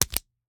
Slap bracelet, smack, slap, whip, felt, plastic, hit, roll up; Eiravaein Works; Vaeyan IV